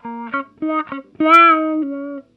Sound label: 101 Pop Guitar Wah Db; Pop Rock; Electric